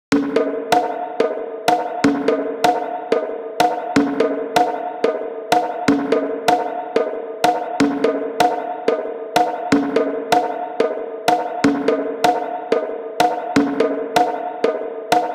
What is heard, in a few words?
Conga Spring